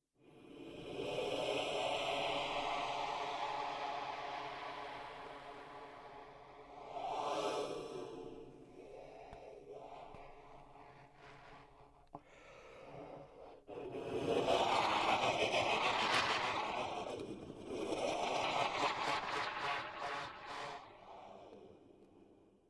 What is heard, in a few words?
trumpet air